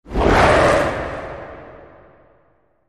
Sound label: Animal Stinger Big; stingers & whooshes